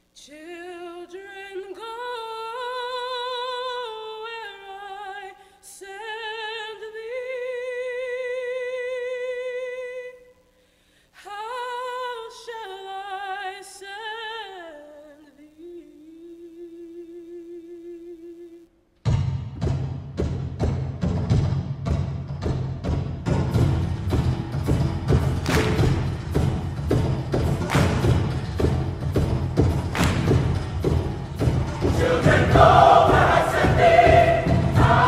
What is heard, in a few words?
live SATB; Children, Go Where I Send Thee